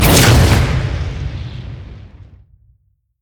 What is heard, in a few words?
summonerspell base ignite; LoL